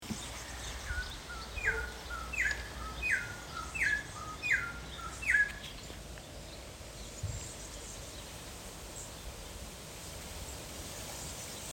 venezuelan troupial

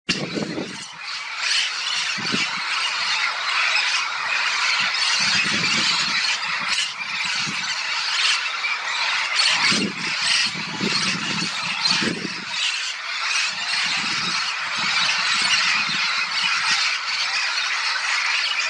yellow shouldered parrot

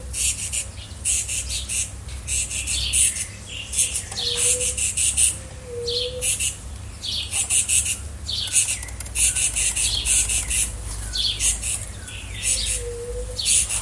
saffron finch begging call

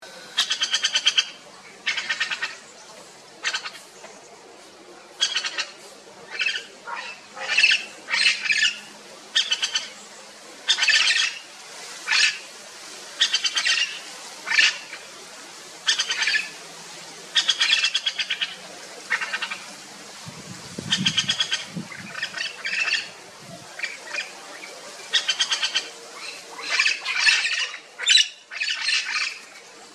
yellow shouldered parrot juvenile begging

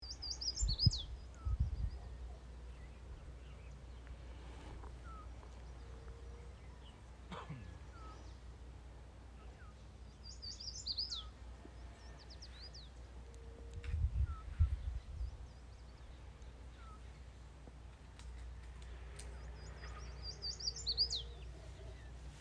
yellow warbler

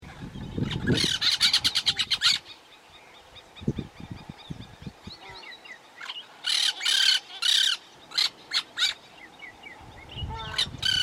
brown throated parakeet

